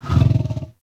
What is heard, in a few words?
Kubrow Tame Interact Affection Closed Mouth Short